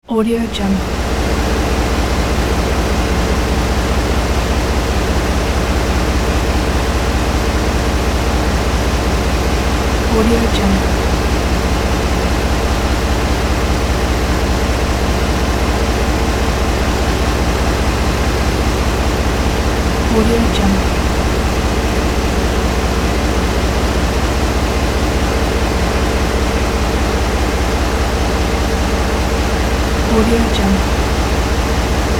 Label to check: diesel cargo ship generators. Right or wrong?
Right